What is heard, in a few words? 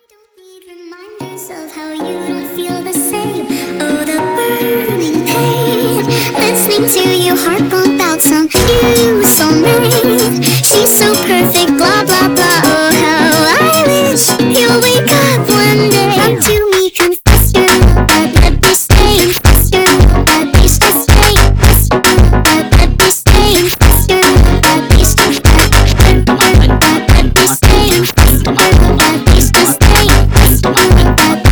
phonk musics